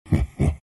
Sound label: treant laugh; dota